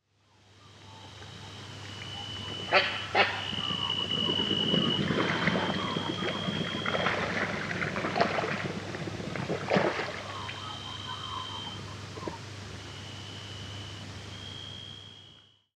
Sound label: Black tailed nativehen